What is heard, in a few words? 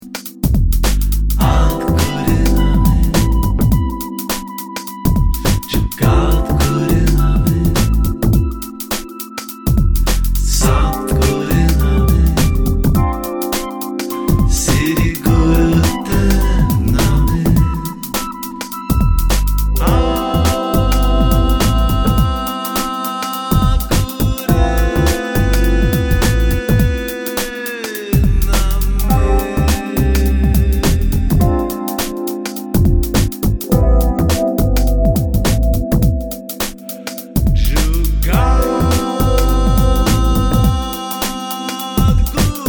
Jazz